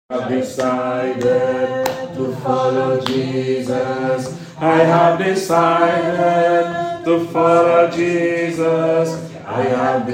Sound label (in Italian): contralti; I have decided to follow Jesus